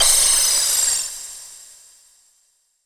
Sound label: SPACE-FX